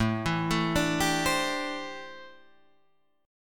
A Minor 11th A down, Am11 chord; Am11 arpeggiated; JGuitar

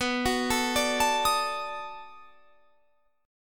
B 7th Sharp 9th, down

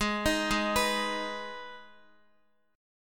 Ab Minor, down, Guitar; arpeggiated; 4E,4A,5D,5G,5C,6F

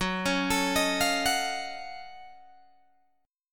F sharp Minor Major 13th, Guitar DADGAD

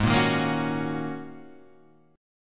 Ab 7th Suspended 2nd Suspended 4th Ab down, chord; Ab7sus2sus4 strummed

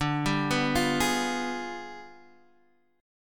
G 7th Flat 9th

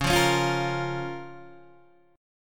Db 6th, Guitar, DADGAD

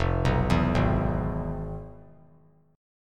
F Minor Major 7th Flat 5th; FmM7b5 arpeggiated; JGuitar

